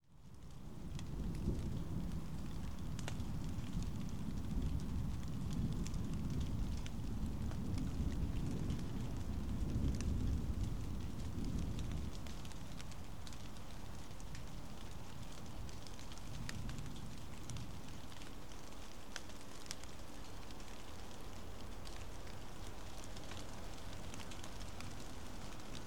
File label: Rain Suburban Fence